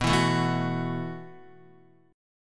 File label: B 7th Suspended 4th B down Guitar; B7sus4; strummed; JGuitar